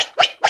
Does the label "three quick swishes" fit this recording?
Yes